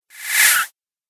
Chirpy Whoosh; sound design

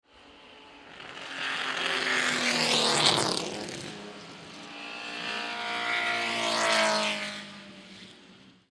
Race Car, 3 Cars Drive By, 1960S Era Road Racers; Two 1960s - Era Sports Race Cars By , 6 Cylinder; Race Car, 2 Cars Drive By, 1960S Era Road Racers; Vehicles

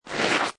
Snow And Ice Ice Scrape Small; Ice (Sound Effects:Weather)

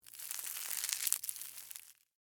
Forest Leaves Slow, Footsteps, Single; Human

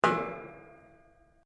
METAL ON METAL; Metal (Sound Effects:Materials)

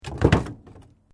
Metal Light Movement Minor; Materials

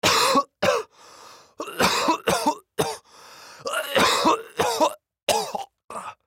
Human Cough; Cough Element Male 25 Yrs; Human Cough Element Male 25 Year Old; Sick; Sound Effects:Human

